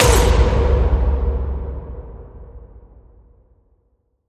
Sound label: Vocal Fury Warrior Hit Hyped